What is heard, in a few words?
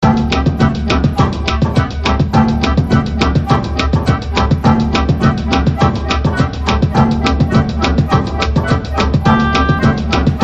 104 BPM; Black Knight Sword